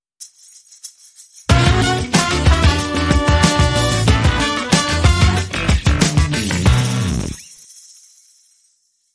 Phunky Sting

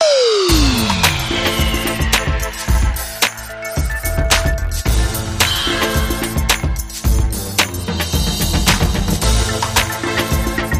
RnB Ramp

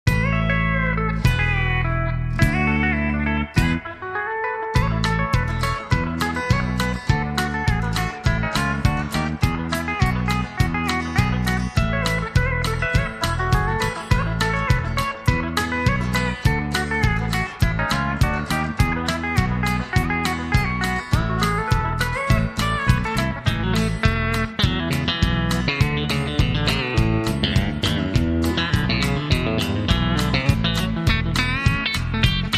Pedal Steel Uptempo

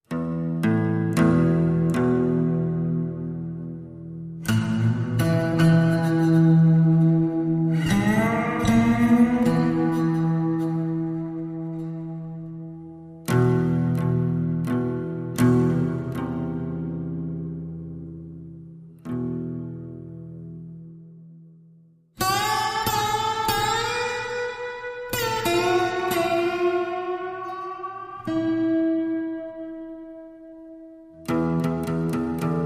Eminor Guitar Only

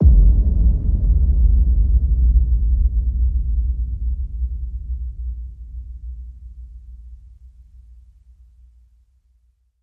Hit Low

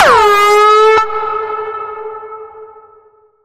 Bend, G

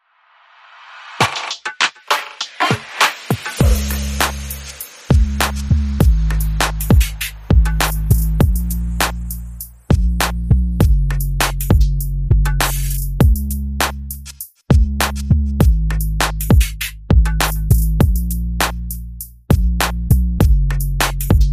Drums Bass and SFX Only

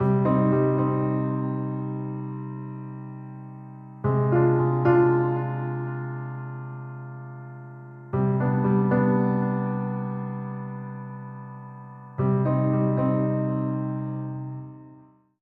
Piano

